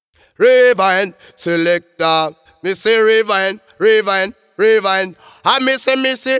Rewind; Reggae (Регги)

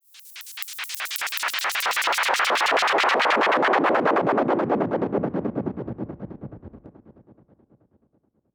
Tekk Noise; Звуковой эффект (Техно-транс); Techno Trance; 140BPM; FX; loop; звуковые эффекты; Tekk Noise D

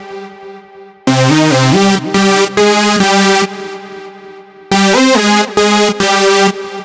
Free Lead, hardstyle synth

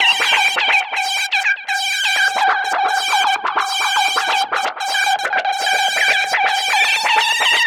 Swootch, звук синтезатора брейкбит

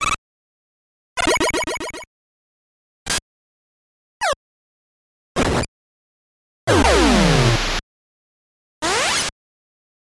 effects; mega man 2

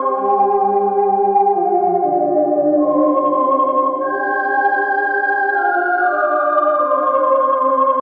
Paradise, pad

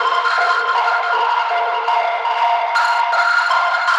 Noise Block, pad

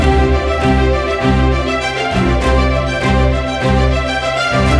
Strings; Blackthirst

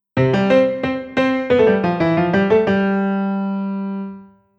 Yin, keys

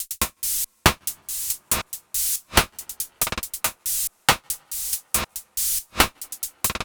Move On, 140 bpm

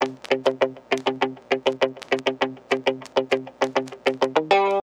Dist Mute; 70’s Funk

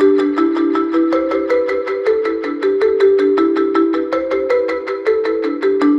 African Space, молоточки

